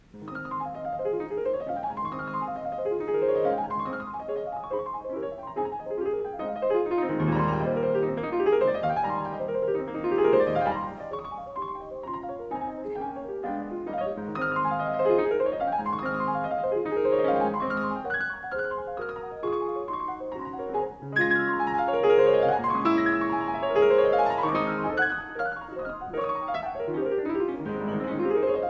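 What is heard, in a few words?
Etude As-dur Presto e con leggierezza, piano; Moszkowski; op.72 Nr.11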